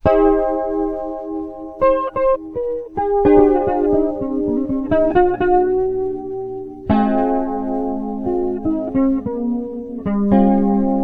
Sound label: free jazz medley